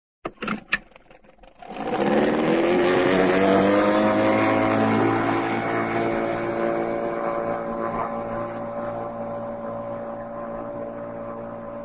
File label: boat, outboard small outboard start and pull away; cartoon